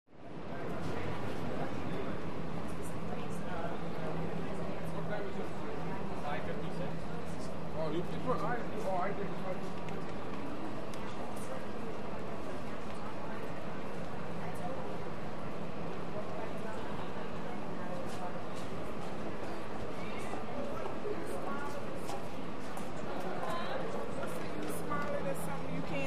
Subway Platform Ambience, Quiet. Light Medium Walla And Footsteps, Distant Roar. Female Announcer Over P.a. Medium Perspective, Two Announcements